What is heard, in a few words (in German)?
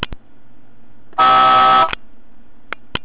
hupe, astra; Soundlenkrad; Astra XS; BobbyCar; 20er Soundlenkrad; Pimp my BobbyCar